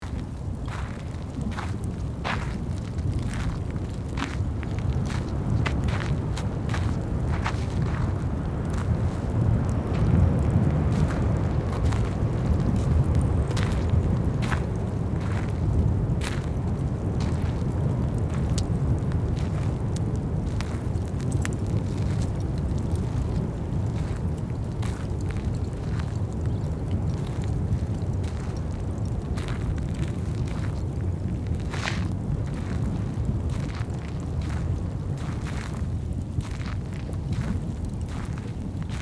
Volcano crater edge ambience loop; nature

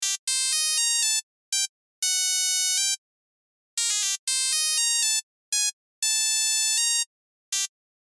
Old mobile phone ringtone call ring loop

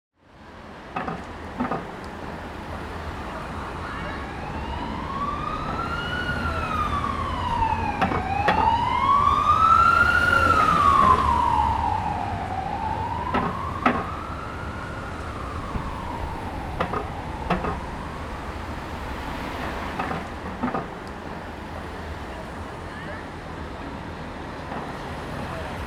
Police car siren passing by traffic city

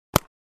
Paintball gun single shot; sport

